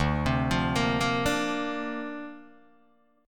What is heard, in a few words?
D-Minor 7th Sharp 5th D Guitar DADGAD, chord